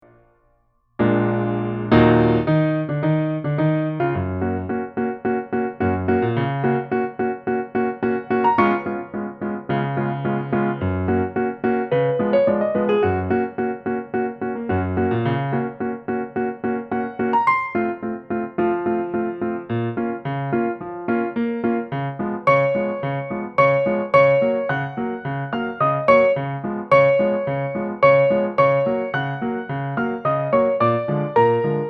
fantaisie, saxophone

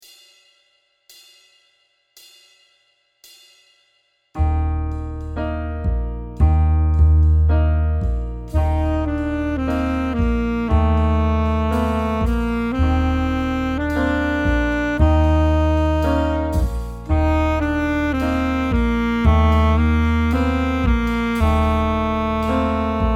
saxophone; mib

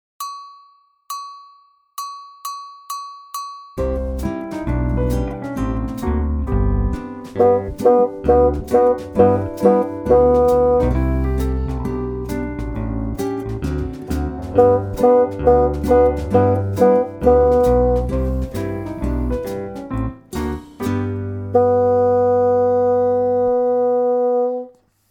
basson

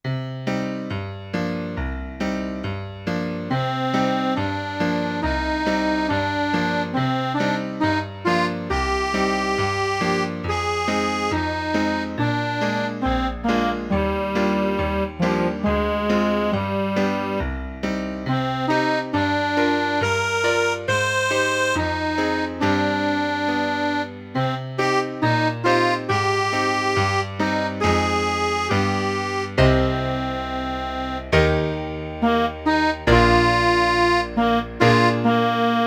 coco notes sax

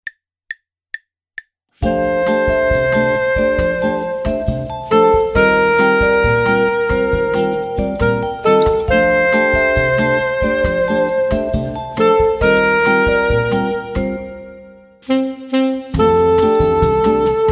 chaperon bleu sax court

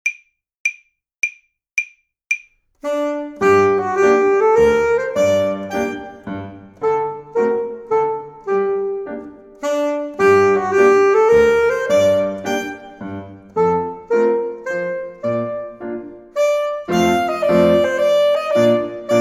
bal musette sax

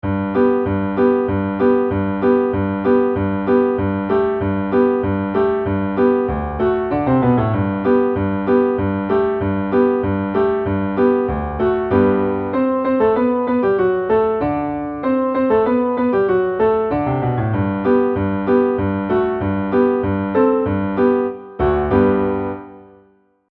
polka, basson

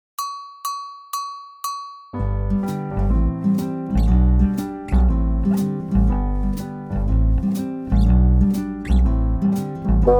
basson; methode basson

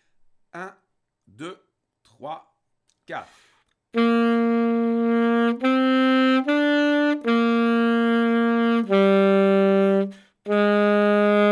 duo des nomes sax

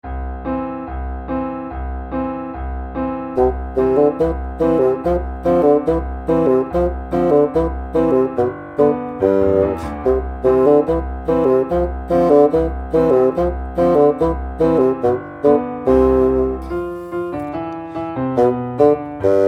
polka basson; czech

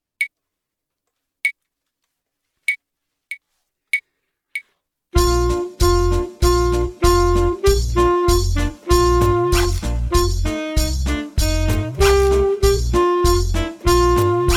le dragon ivre sax

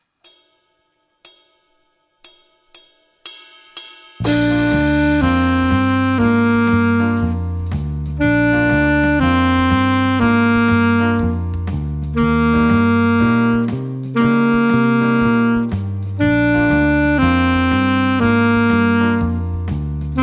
saxophone; mib

